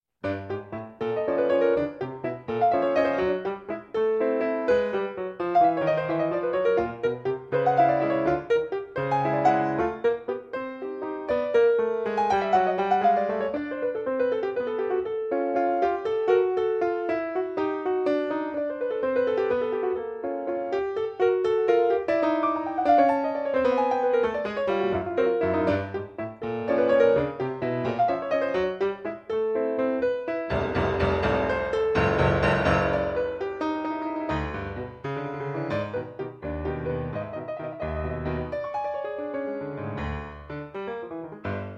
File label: piano